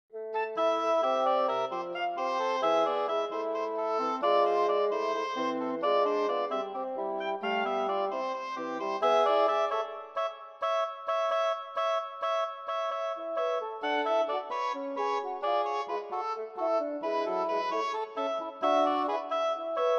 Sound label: double reed workout; miniatures